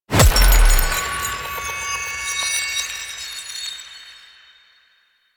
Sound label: Glass Reflect Impact; DE; Warframe